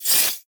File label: scrape book quick